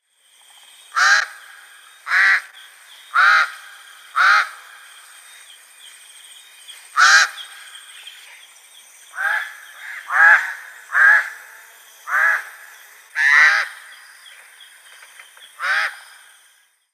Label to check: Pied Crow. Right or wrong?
Right